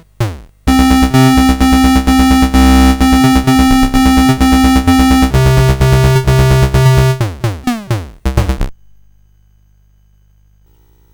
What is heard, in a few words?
Music CSG8580R5; SSL; Suicidal Software